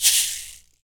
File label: shaker, shaker loops; Roland LCDP14 Africa VOL-2